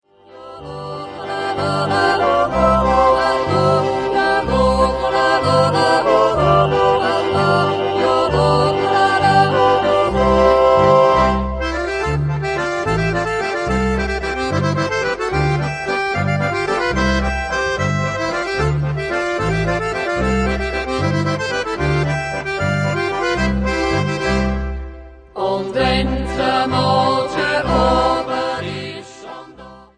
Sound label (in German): potpourri walzer